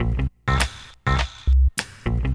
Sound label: HipHop Combo Loop Cmaj; Digidesign; Pro Tools Essential Loops